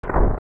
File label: Wallmaster Grab; OOT